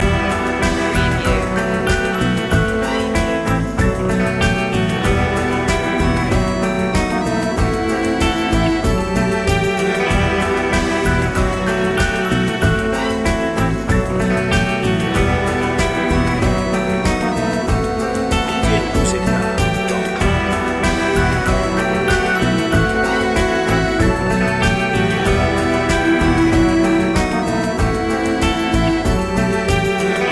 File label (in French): amour et plage loop